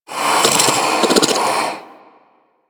Armadillo Vocal Snore; Characters; Warframe; Hunting Critters

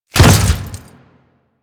Step Flesh, Footsteps; Warframe; Grineer

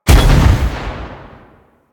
Army Proj Explosion; Warframe; Hoplite

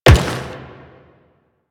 Step Metal, Footsteps; Warframe; Quests; The New War; Kahl

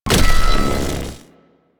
Hoverboard Jump Charge Ready; Warframe; Vehicles; Venus; anim sounds

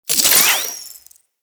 Necramech Repair Drone Idle Movement; Enemies; Warframe

